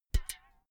filled can hit dripping

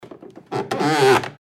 door squeal

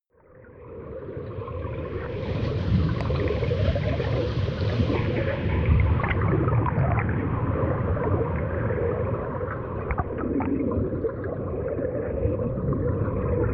water fx

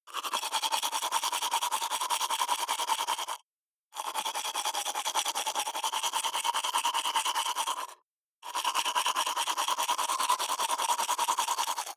teeth brush dry open molars fast

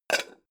pan close chink soft

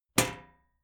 club hit sheet ring medium

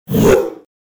move strong short

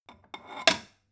stone bowl shelve

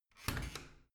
washing machine open

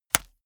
small punch hard

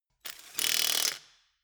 impact wrench screw fast single

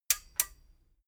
metal button stereo click enable slow

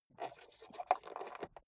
rotary control general creak

